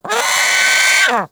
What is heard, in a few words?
Sea Bird Vocal Alerted; Characters; Warframe; Ostron; Hunting Critters